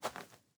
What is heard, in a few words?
footsteps GRASS; PC Building Simulator